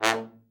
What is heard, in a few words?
trombone; Best Service; Killer Horns